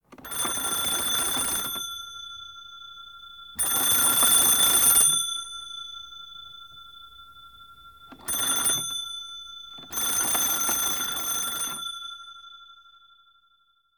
telephone

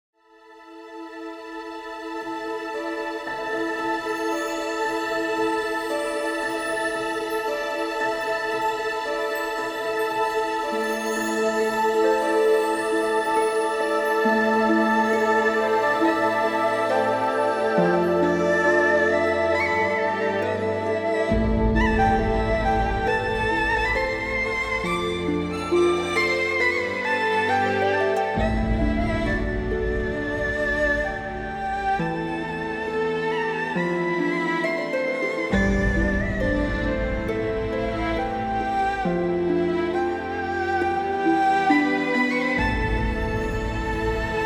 Scene Music; Age of Wushu; Windows

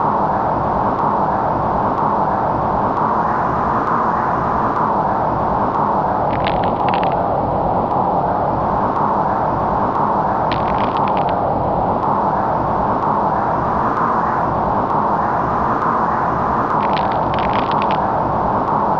Wind Ambience; gba; Spyro Attack of the Rhynocs

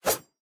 Melee; boomerang fu

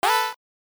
Hopping Sound; hopping mappy

